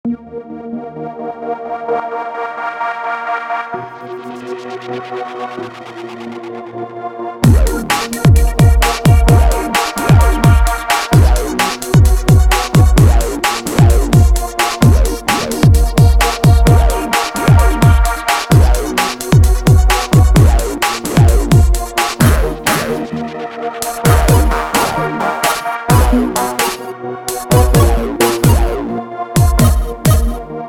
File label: darkbase